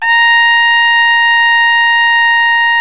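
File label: BRA TRUMPE, trumpet, brass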